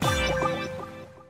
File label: News Tone